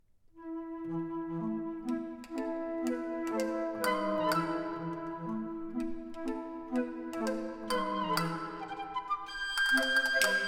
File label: flute; Quintessenz flute ensemble